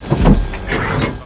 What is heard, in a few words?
Step